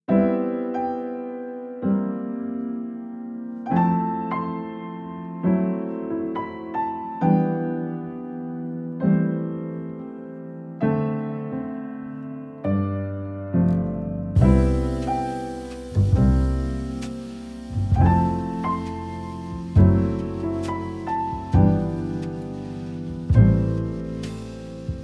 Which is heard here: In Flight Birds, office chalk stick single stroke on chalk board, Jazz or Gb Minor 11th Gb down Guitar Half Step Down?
Jazz